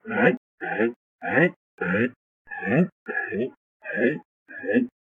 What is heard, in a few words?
walk; I Am Weapon Revival